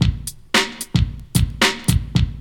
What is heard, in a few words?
LOOP; E-Lab; X Static Goldmine 2; akai